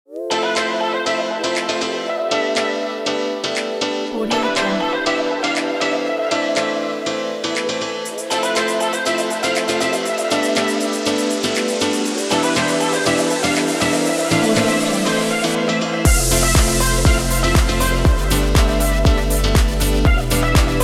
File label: upbeat house